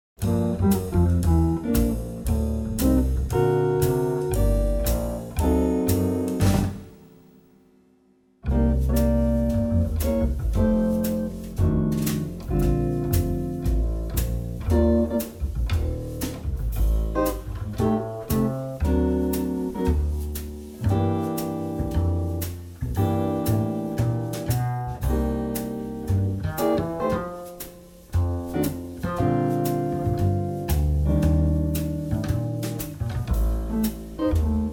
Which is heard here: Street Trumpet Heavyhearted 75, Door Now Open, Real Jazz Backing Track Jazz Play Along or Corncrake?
Real Jazz Backing Track Jazz Play Along